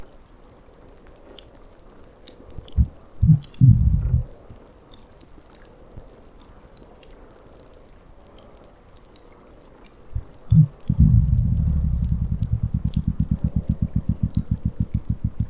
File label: pulses red grouper